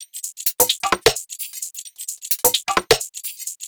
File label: perc tops